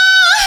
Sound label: ahh high, female vocals; PlatinumPhattCD2; E-MU Producer Series Vol. 8 – Platinum Phatt